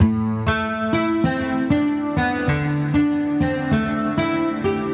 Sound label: Guitar